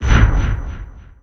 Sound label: Pickup Magic; SFX Kit; Pickups